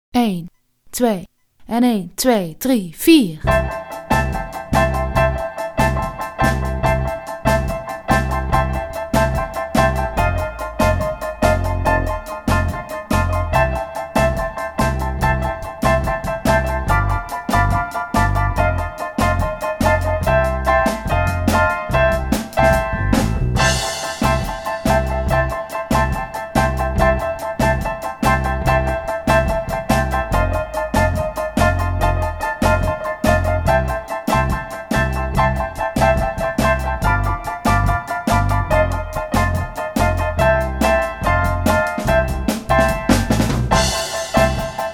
improvisatie; Genre: Blues

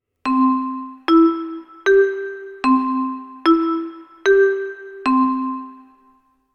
viertoonladder

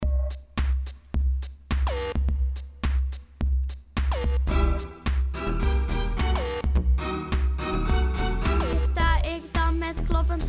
vlaams zang

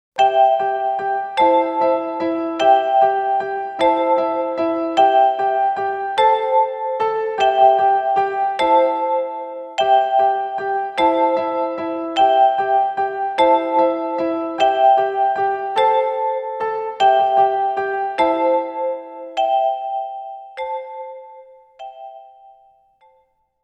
lied begeleiding

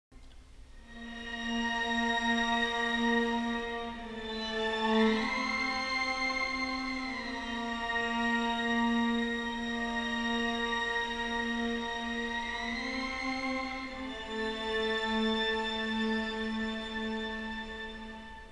lange klanken

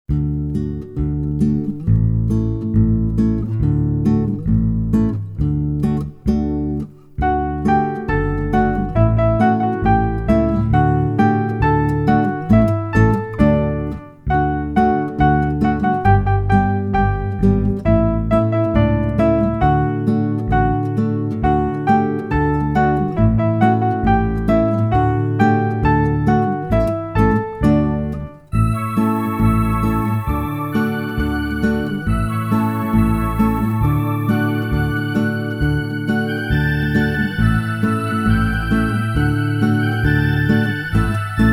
zonder zang

